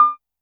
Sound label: korg trident